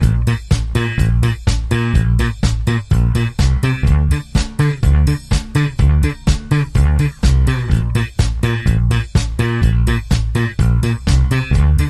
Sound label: Dance Night Bass